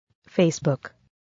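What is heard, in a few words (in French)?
fichier de notification; Facebook; samsung s7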